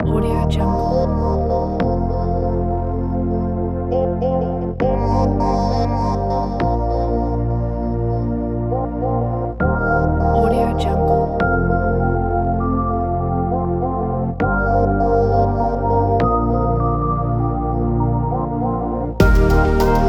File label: inspiring ambient; music-waves-production